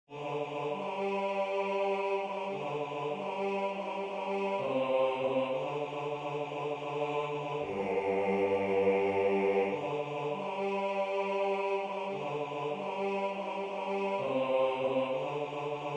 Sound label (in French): African Song Basse; Siyahamba; Concert « Amour et Traditions »